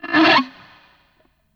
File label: GTR, GUITAR FX